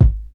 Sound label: deep hip hop kick drum single shot G sharp key